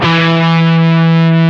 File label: DIST GT2 E2, DIST GUITAR; DIST GUITAR2; AKAI S-Series CD-ROM Sound Library VOL-7